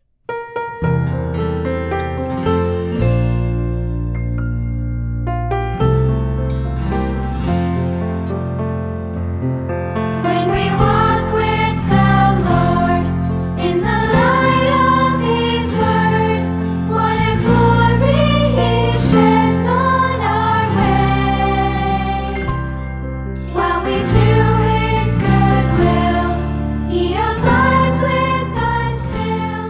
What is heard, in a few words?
band